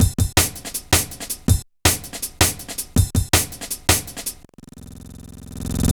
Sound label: Re Group, Drum Loops, Jungle, 162; Jungle Warfare 1&2